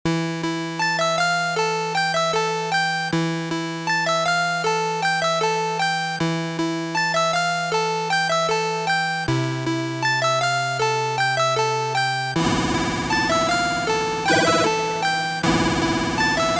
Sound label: 8bit